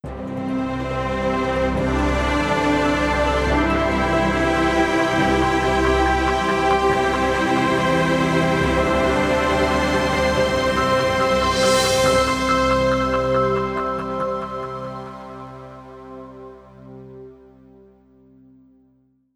Game Win

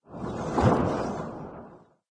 Sfx - Building Mine

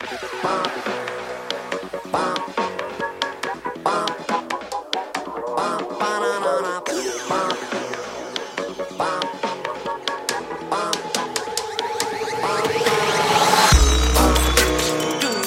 Water Beat

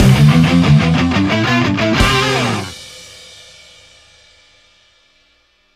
Base Captured Sting; rogue aces

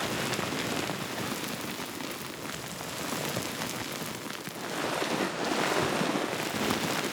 dirt slide loop

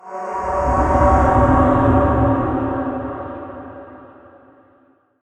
Creepy Stinger

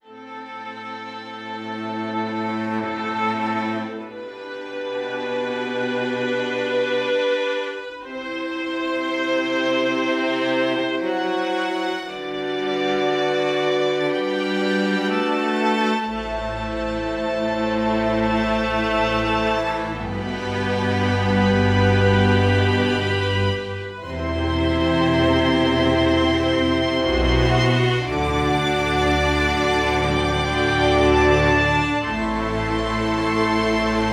Marsh Door Opens; the last campfire

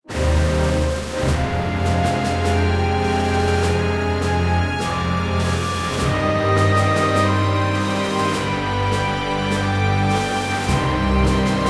Russian Lose

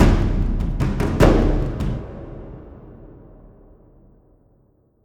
fight jingle; Of Orcs and Men; PS3 Windows Xbox 360

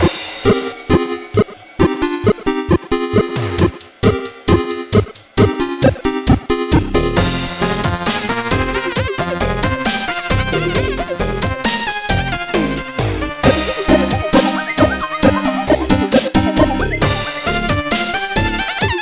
Combat Keystone B; SanctuaryRPG

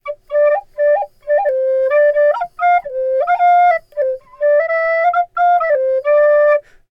Flute Player Melody; gamerip; The Witcher 3 Wild Hunt

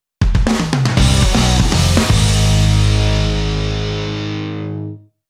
challenger; persona 4 arena ultimax

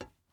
glass step

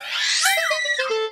special mission skills scream

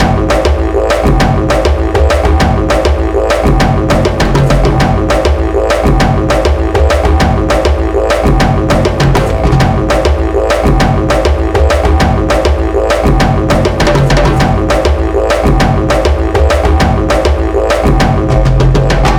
Environment Frog Tribe Music; Trailblazers

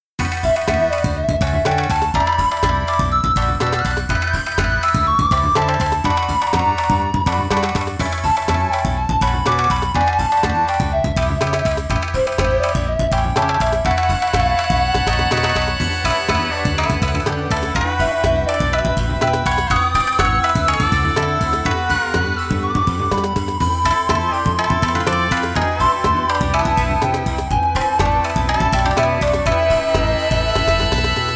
Far Eastern Land; RPG Maker 2003

